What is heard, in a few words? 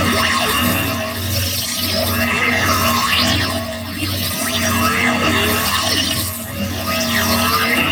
Energy Leech Loop; Warframe; Eximus